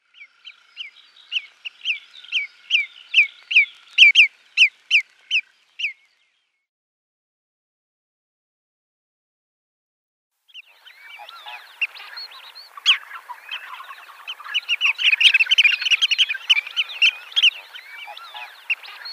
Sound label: Marsh Sandpiper call